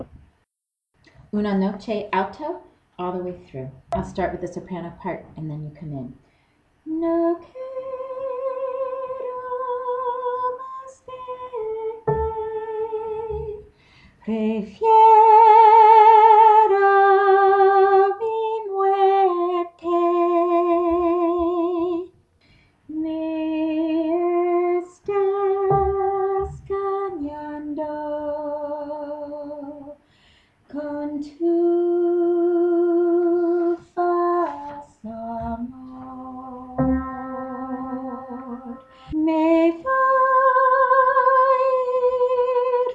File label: alto listen and repeat; una noche